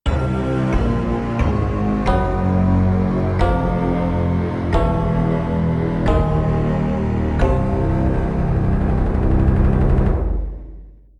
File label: loss; TGOP